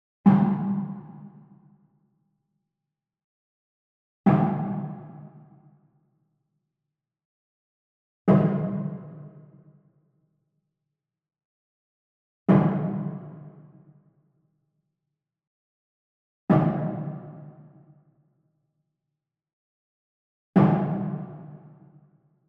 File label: Wood Percussion Wood Drum Mounted Hit Beater Far; boom library; Cinematic Strikes Construction Kit